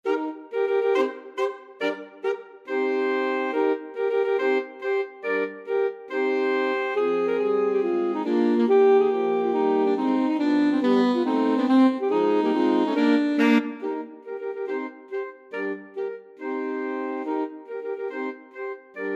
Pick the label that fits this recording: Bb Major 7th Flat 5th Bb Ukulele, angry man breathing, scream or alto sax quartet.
alto sax quartet